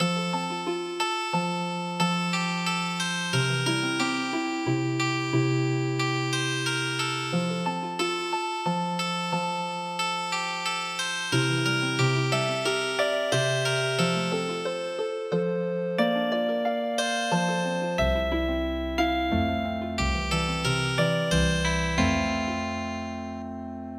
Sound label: vienna waltz Healing Harp; midi; traditional